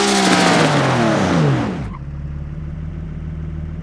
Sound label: braking slow, nissan skyline gtr r34; cyn-cars